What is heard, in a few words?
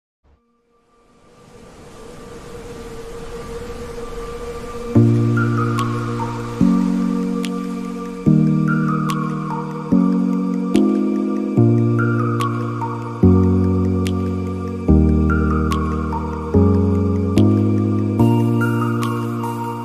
Outro sound